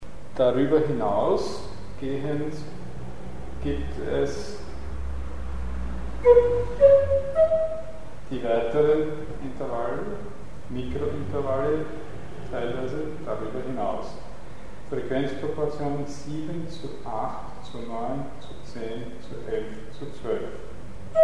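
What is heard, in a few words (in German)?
Partialtöne 7 - 12; Mikrobuch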